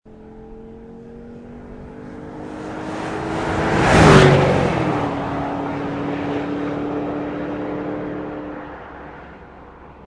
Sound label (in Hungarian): elhaladás, porsche cayenne